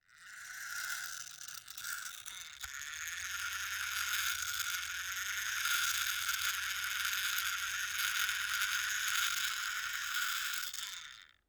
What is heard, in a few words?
Clock Motor; Haunted Bloodlines; SFX Producer